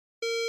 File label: High Button Beep Shr